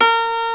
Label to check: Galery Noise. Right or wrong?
Wrong